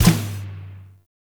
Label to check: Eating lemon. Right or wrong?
Wrong